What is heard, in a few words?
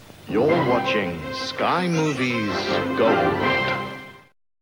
Sky Movies Gold jingle